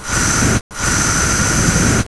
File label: aerosol